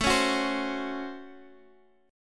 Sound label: Db Major 7th Suspended 4th Sharp 5th A